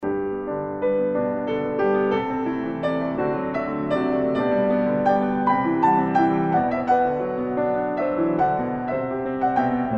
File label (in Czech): Lyricky valcik